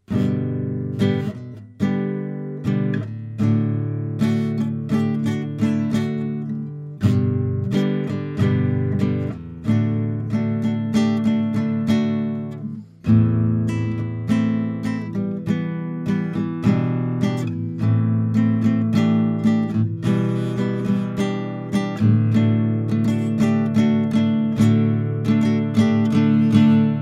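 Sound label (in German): Lauten Audio LA 220 V2 LPF off HPF on akustik Guitar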